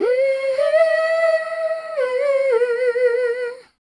MEL C2-R, Voice Spectral

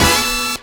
HORNHIT; E-Lab; X Static Goldmine 2; akai

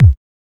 HSE-BD

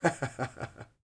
LAUGH

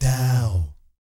"DOW" C3, Vocal Jazz Fx; Spectrasonics; Vocal Planet Vol.3 Jazz Fx

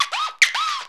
SCRAT; E-Lab; X Static Goldmine 2; akai

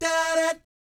"DAH DAT", vocal jazz fx

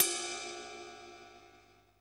R8 RIDE

